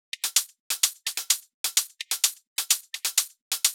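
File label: Drum Loop 128BPM hi-hat; VEC5 Drumloops; VEC5 Multi Loops 128BPM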